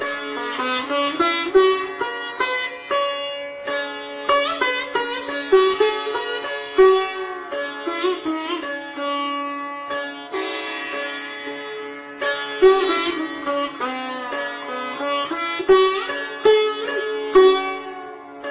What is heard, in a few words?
sitar raga snippet